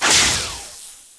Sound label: sniper miss, weapons; Tribes2